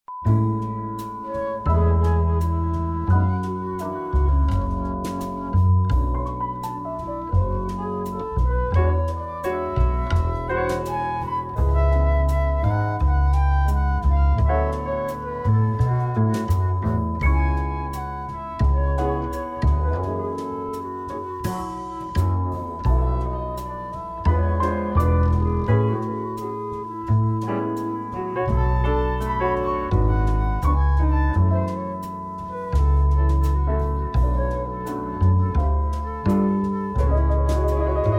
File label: cheerful time